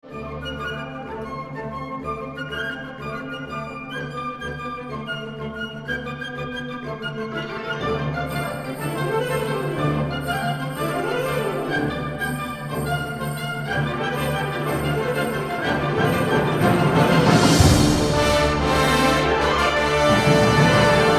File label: Variations on a Theme of Aynu Folk Songs